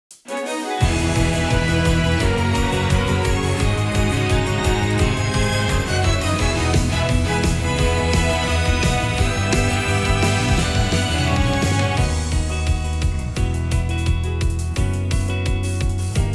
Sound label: One Chorus Karaoke